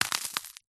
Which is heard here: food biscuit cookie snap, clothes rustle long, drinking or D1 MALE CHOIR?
food biscuit cookie snap